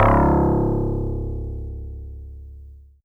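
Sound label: Easy Clav DX100 C#3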